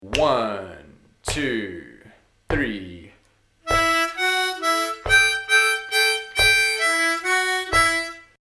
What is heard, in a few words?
Lick, harmonica